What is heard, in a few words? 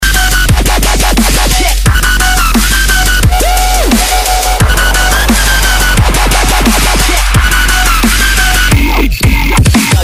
win, music